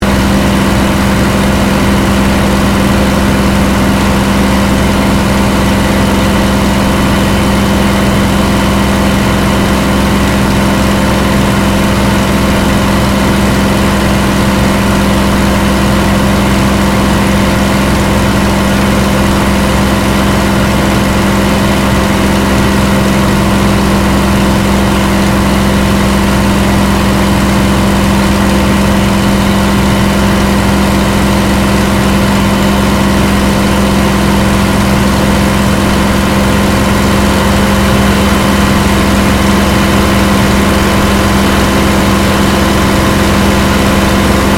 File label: AIRCRAFT SUPERMARINE SPITFIRE MK-VIII FLYING INTERIOR; Airplanes; Airplane Sound Effects